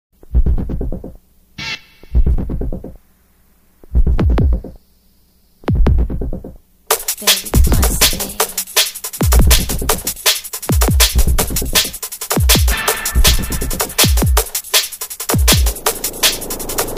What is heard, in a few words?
jazz